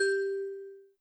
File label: Key